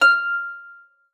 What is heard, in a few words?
E6, HARP; Xsample VOL-8; HARP P+MF